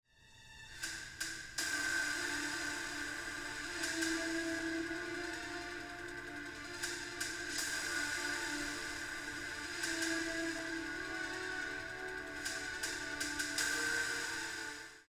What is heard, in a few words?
other cinema mode, monitor; 一般螢幕喇叭; 戲院模式; 加強低音，保持人聲清晰。; BenQ 2.1聲道內建喇叭試聽